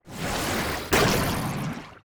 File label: water golem strike; Shelter